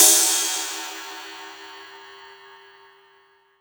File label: Rides, Cymbals